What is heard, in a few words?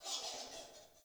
Under City Alley Critter